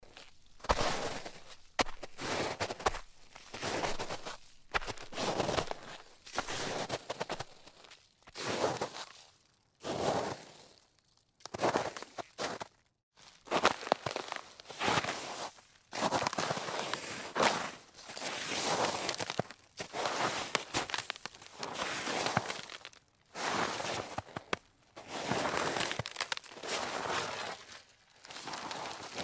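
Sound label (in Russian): осторожно медленно по глубокому снегу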